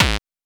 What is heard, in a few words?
BD KICK FX